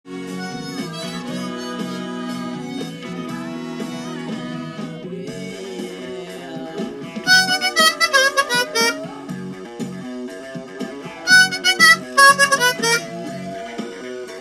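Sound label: Guitar Harmonica Call Response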